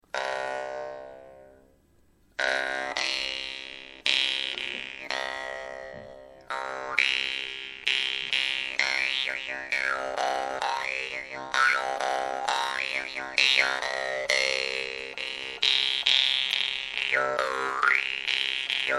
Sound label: vargan; russian vargan ustinov big